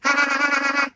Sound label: Laugh, Robot